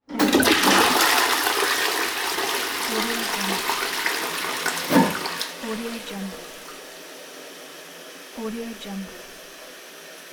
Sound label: toilet flush and tank fill